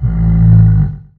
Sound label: golem